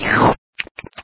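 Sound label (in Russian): хлебание с чавканьем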